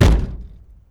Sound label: small shock, physic vehicle; openspace3d